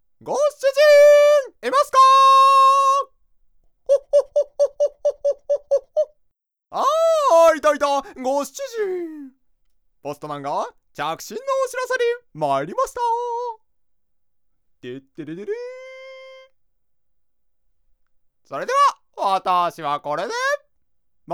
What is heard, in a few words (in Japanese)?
着信音